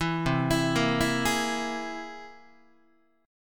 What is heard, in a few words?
C Augmented 7th, Guitar; arpeggiated; JGuitar